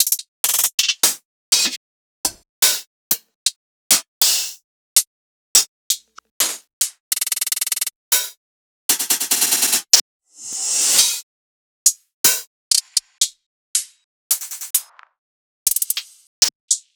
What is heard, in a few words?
One Shots Hats